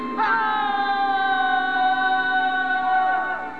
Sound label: ahhhhhh; Yelling off the Brooklyn bridge; Jack Kelly & Boots